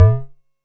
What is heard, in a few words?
vermona drm1 mk3 tom